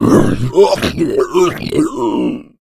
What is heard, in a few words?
boomer shoved